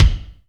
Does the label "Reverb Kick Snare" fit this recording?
Yes